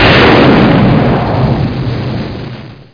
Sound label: BLAST; Duke Nukem 3D Kill-A-Ton Collection